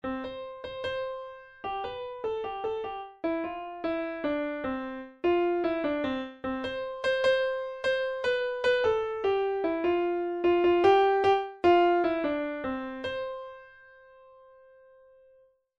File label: Piano Midi; Adventure Time; Primer Level